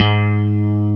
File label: GUI STRATO, E-Guitar; E-GUITARS